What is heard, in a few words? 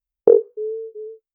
bip sound for completion